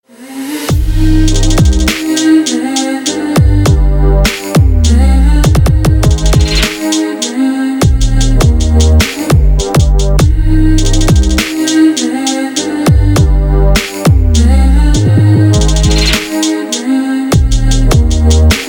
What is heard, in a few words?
trap